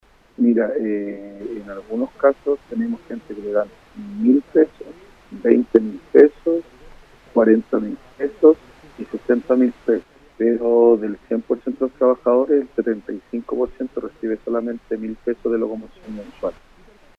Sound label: SAXOLINE